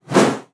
BOW PERC, BASS FINGER; Xsample VOL-5

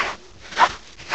SAND WALK; M8; CMI Factory

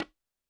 percussion, Roland TD-20

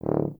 BRS TUBA, Solo Instruments

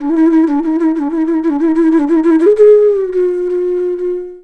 FLUTE D, Indian Traditions; USB Soundscan vol.45; AKAI

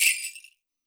skranz, WORLD PERCUSSION

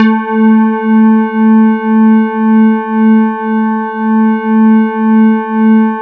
E-HARP, DX HARP; Wizoo - Powered DX

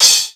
CHOKE, DRUMS; East West; Global Sounds

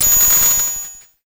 Hat, Cwejman S1; Megenta Hat; DevSnd Cwejman S1 - RD

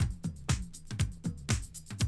120, Classic Drum Loops

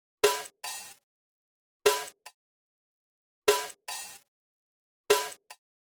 Tartar Sauce Layer 148, drums loops; RoBKTA Sample Pack Supreme

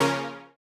Housey Stab C#3; KG MegaSaur

